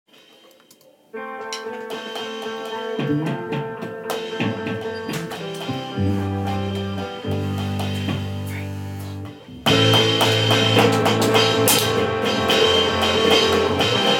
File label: simple drum beat